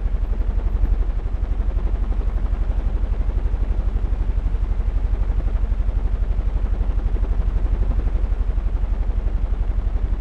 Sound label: giant fan LFE blade; Titanfall 2